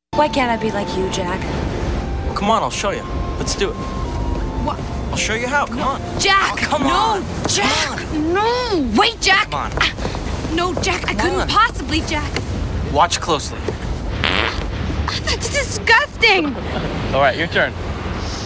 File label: titanic fart scene